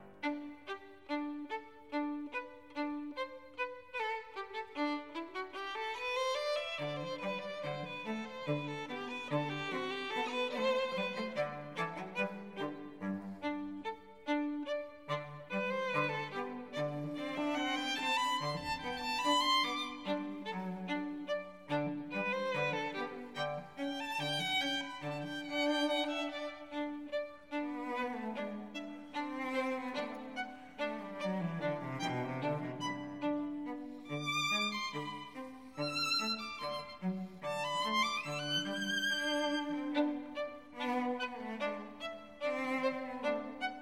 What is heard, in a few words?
Piano Trio in G Major, Variations; Beethoven; Piano Trio No. 11; 10 Variations on 'Ich bin der Schneider Kakadu', Op. 1; Itzhak Perlman; Beethoven Complete Piano Trios